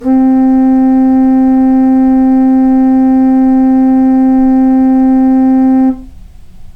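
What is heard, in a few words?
Mixture V-2-15th, organ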